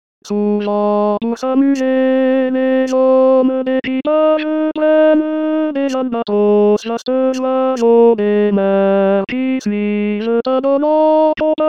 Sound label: tenor; lalbatros; rimailleurs